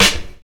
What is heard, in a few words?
sharp snare drum f sharp key, Loudest frequency: 3168Hz